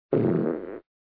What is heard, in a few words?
FARTED